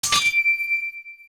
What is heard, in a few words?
Crystal Switch; Zelda; OOT